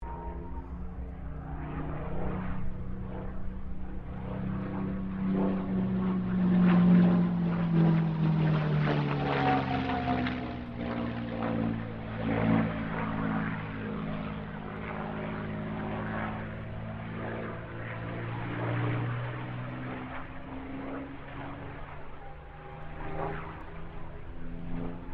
AIRCRAFT CA-18 MUSTANG PASS; P51 /CAC-18 Mustang; Airplanes; Vehicles

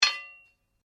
Blade Heavy Moving Impact Ring, Sword

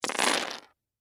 Game Pieces, Drop, On, Board; Sports; Plastic; Monopoly, Houses; Board Games; BlastWave

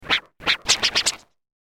Dj Crazy Vinyl Whoosh; Whoosh Sound Effect

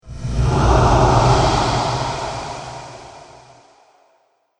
Pyramid Phantom, ghost sound effects; Phantom Sound Effect - Surreal Ghostly Apparitions; Andrew Fleming

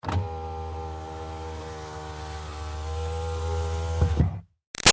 Car Highlander Power Window Up; 2005 Toyota Highlander V6; Cars; Vehicles

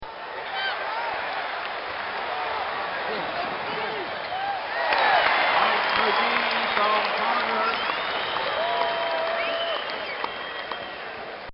Sporting Event Crowd; Bobby Cole

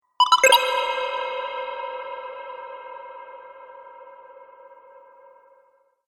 Game Cavern Tokens; Video Game Sound Effects

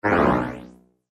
Chime Multimedia; Web Interactive; Chimes; Technology; Blastwave

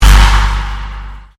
Convolution Pulse

